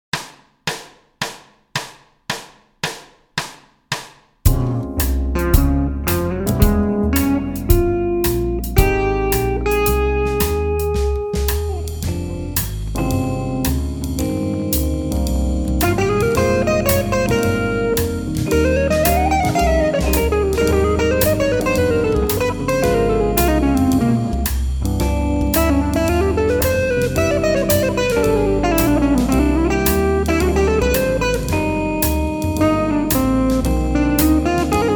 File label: improvising mix, guitar